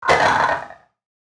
damage, fractal guardian; Legacy

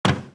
Footstep Metal; Footsteps

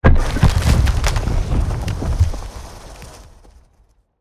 Ice Creak; Damage

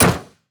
Hit Metal; Damage

